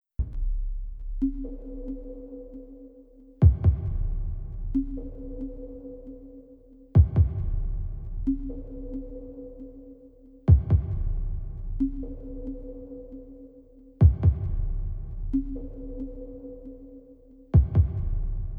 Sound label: sonar groove, 432 Hz